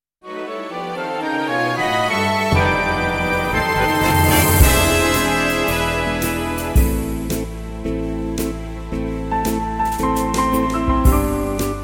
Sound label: foxtrot